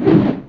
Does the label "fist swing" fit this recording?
Yes